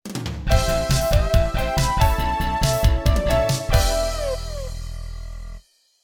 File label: Before Combat BGM (TG-100 Version); Asuka 120% Burning Fest.; x68000